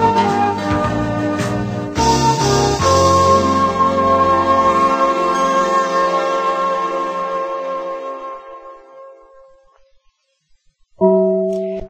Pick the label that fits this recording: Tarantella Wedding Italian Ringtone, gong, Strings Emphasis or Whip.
gong